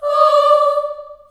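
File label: D4 Choir Children Ohs, Choir Acoustic & Synth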